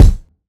Kick; Ableton Live 12 Lite; Core Library; Drums

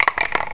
drop hop, paintball hopper; Digital Paintball 2

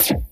FX Zap Vocoder; FX Hit; Drums; Ableton Live 12 Lite; Core Library

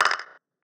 FX Click Glitch; FX Hit; Drums; Ableton Live 12 Lite; Core Library

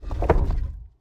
elevator start; puzzle

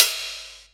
Crash, Cymbal

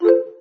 menu item activate; uisounds; There; ThereClient

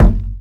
Kick Soap Box Slap

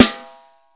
Snare

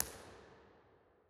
Real Places Mid Way to Altar, Impulse Responses; Ableton Live 12 Lite; Hybrid

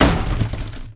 block break; Gish

